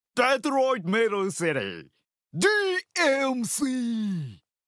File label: Metal